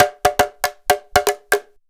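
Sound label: emelako grooves; Best Service; World Colours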